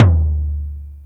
mid tom one shot d key, tom drum tuned to the D note. Loudest frequency: 270Hz